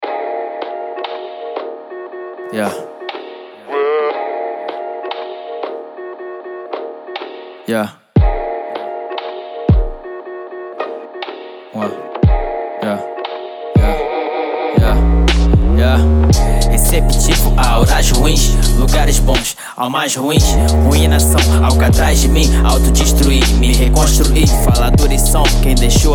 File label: boomtrap